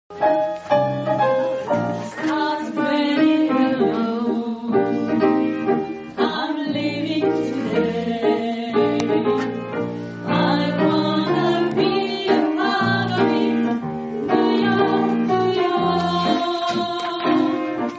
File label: soprano